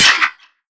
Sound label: npc charger shield, bullet impacts; physics; Half Life Model Pack